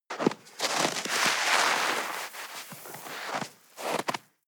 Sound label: snow grab